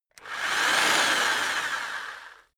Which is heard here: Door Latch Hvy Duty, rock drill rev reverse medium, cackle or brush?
rock drill rev reverse medium